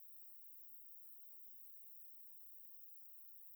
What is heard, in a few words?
Myotis daubentonii, batcalls, europe